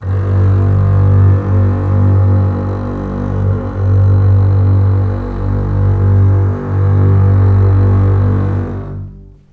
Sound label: G1 LEG F, String Collection; East West; The Ultimate String Collection